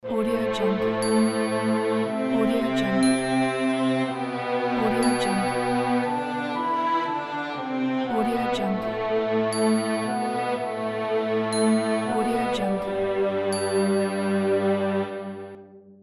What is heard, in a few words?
classical requiem